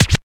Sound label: Unlock; Nokia